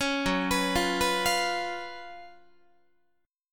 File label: F sharp Major 7th Suspended 4th, Guitar; F#M7sus4 arpeggiated